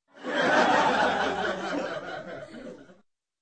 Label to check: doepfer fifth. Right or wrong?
Wrong